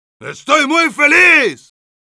positive vocalization; Heavy

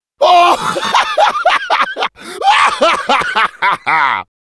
laugh long, fr; Demoman

